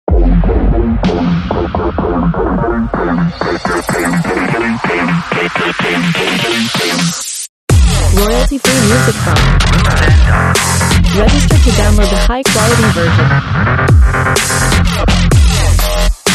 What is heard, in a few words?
Space Energy, Mighty and bright sound of synths with a hard beat